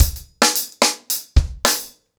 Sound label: 110BPM, ACOUSTIC DRUMS; The Roots Drumloops; Border Case